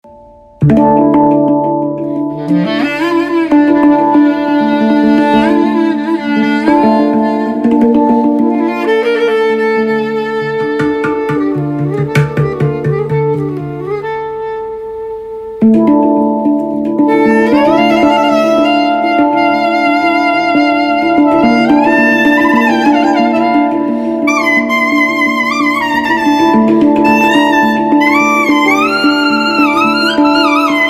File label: Handpan, Istanbul Street